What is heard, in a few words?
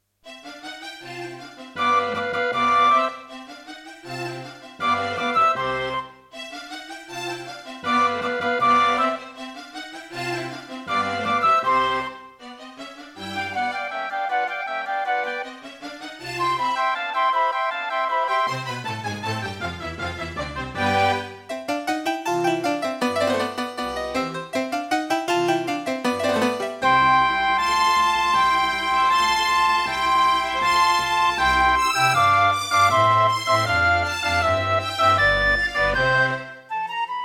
Mozart Concerto K467 partial, Soundfont; classical